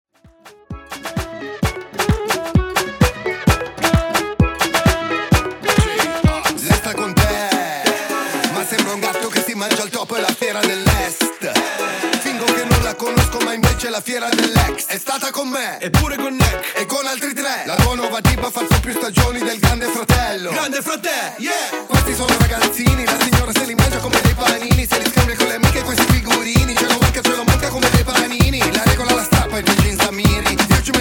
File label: Salsa Afrobeat Salsa 130Bpm Clean